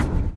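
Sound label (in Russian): короткий металлический звук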